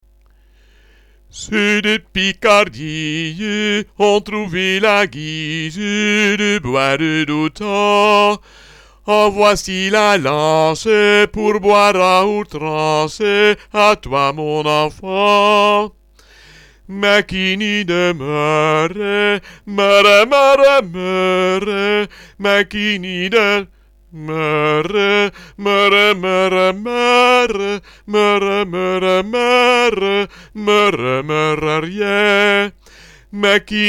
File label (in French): Ceux De Picardie Bas